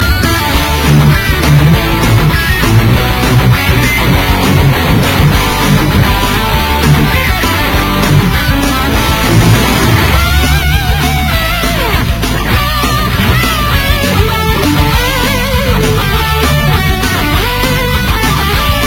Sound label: Rock Canon